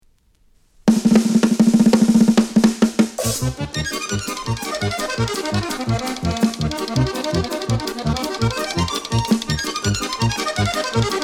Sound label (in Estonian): Musette, Ragtime etüüd